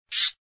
entity dead; croods

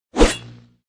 bullet create; croods

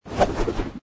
bullet create; croods

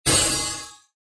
ice; croods; battlespecial

